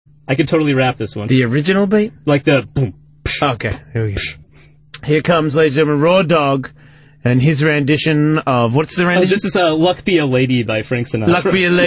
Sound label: rap